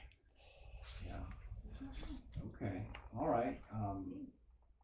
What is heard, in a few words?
Womans Room Spirit Box; wexford general store antique shop return investigation